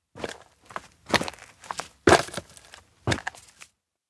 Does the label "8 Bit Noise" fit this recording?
No